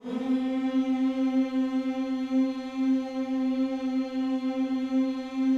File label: HMMS C 4 A, gospel hmm; Spectrasonics; Vocal Planet Vol.1 Gospel; akai